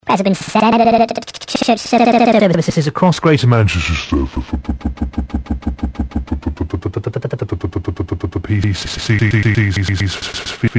granular news

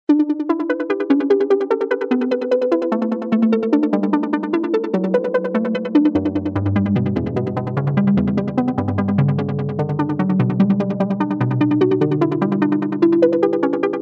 ping pong, Digidelay

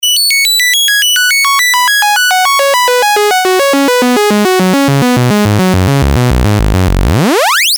saw wav

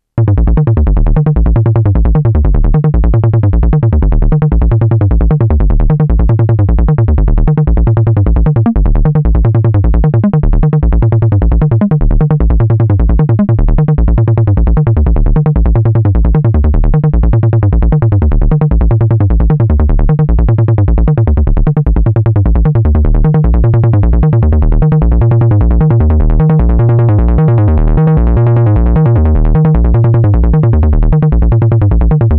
bass seq

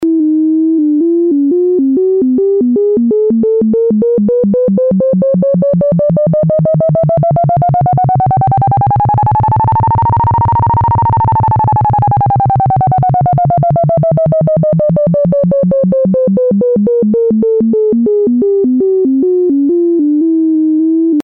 square VC gain; Quad lfo

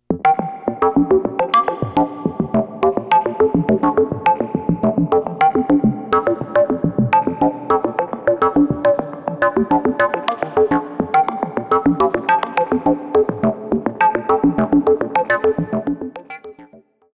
Forths; Quantizer

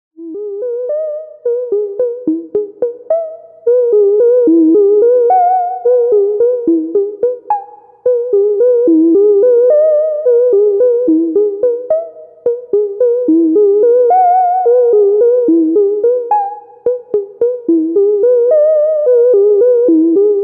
envelope cv

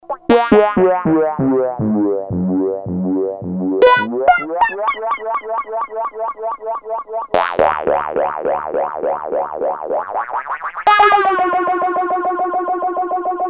varidelay

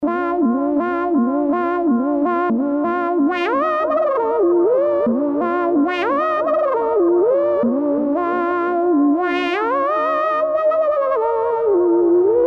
vocalize

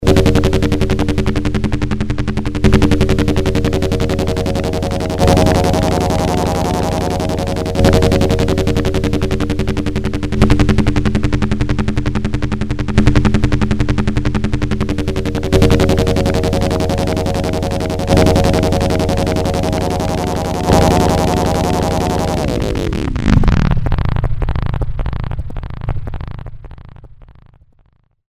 deep, VCDO

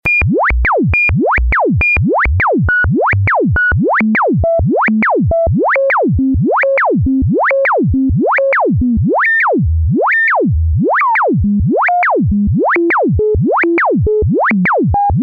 trapazoid morph; Quad lfo

